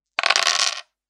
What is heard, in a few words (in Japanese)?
サイコロ三個を振る